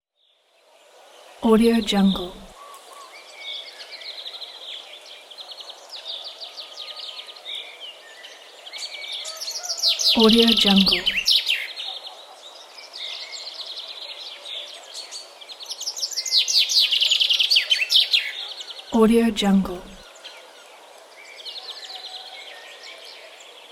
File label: forest ambience near village